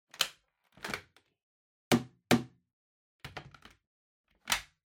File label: Vacuum Cleaner Dust Cup